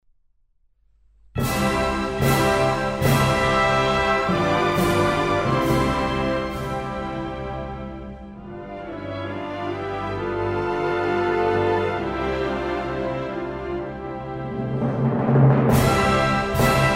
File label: National Anthem, Instrumental; Croatia, Republic of; Croatian National Anthem